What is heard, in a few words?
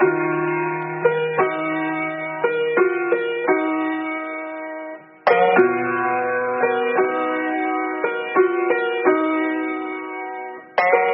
piano, Sad